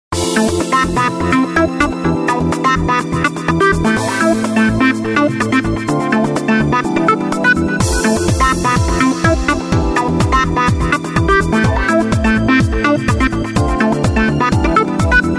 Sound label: Chic baba; Samsung